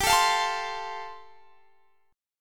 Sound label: G 7th Suspended 2nd Suspended 4th, Guitar Standard; G7sus2sus4 strummed